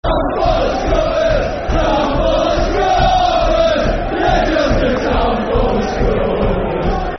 Town Full Of Scrubbers, Football Chants (Soccer Songs); Sheffield Wednesday Swfc